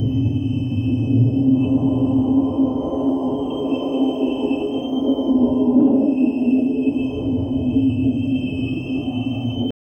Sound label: sustained underwater magi